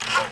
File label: Robot Oneshot; BedRoom